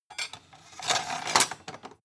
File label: sword handle ring